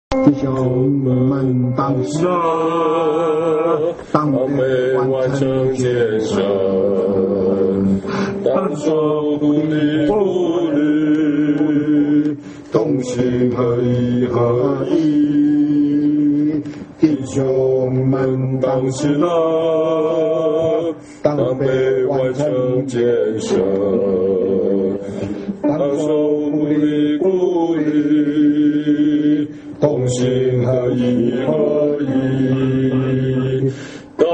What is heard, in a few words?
hymn; worship